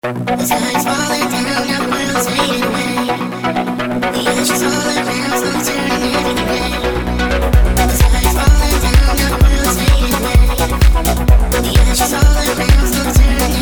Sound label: Avenger 2 Chord Crawler; Vengeance Sound; Melodic Tech Voices 1